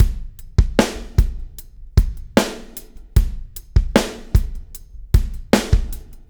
REV, REVEREND; Spectrasonics; Backbeat

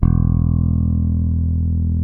SB E0 SOFT, SPECTOR BASS; Northstar - New Gold VOL-1

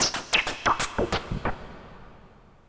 Percussive

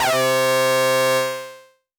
Synth C, Synth Prophet One Shots; Electronisounds

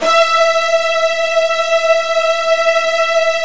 Virtuoso Strings

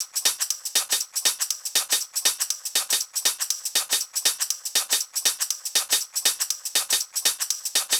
Hh Sh Loop 120; Equipped Music; Loungin House

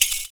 FOOT PERC; Univers Sons - Raricussions

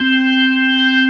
B3 C 3-3, ORGAN

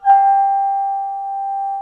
DSP; OMI - Universe of Sounds

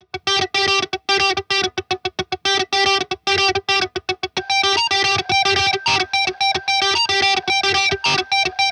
Melody Loop; Kagi

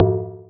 Tom Thing; M8; Electronisounds; Enigma Dub Cube One Shots; PERC

